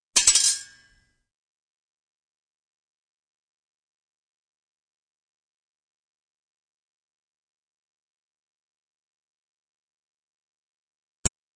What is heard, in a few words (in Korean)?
Fights, 싸움-전투; 헐리웃특수효과음